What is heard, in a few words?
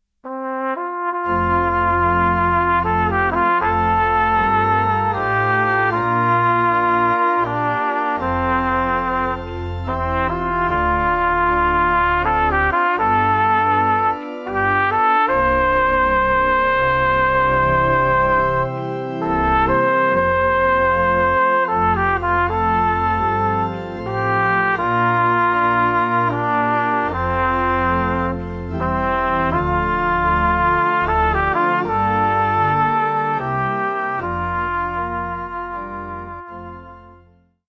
amazing grace trompet